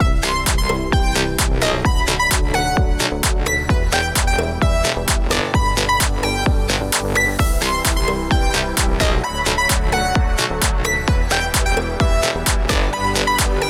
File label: Sitar Sway; vlog